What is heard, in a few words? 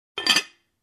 Closing porcelain pot